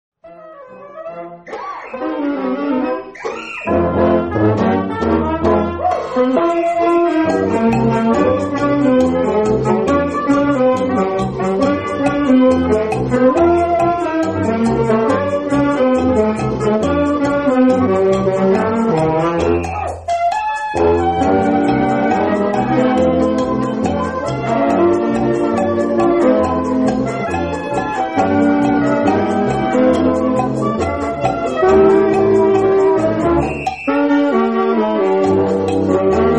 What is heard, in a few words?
Samba